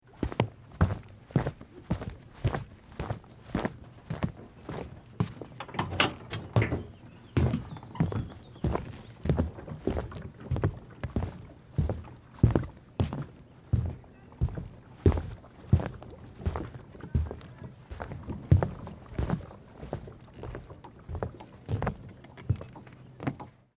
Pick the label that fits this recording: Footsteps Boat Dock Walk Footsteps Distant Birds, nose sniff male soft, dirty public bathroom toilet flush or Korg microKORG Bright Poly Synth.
Footsteps Boat Dock Walk Footsteps Distant Birds